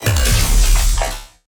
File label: Lasrgun Electron Impeller Charged Fire